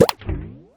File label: Zip Boing, cartoon